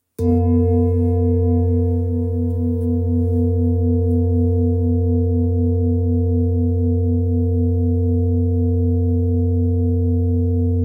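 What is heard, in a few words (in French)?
Bol ramgandi mi, bol chantant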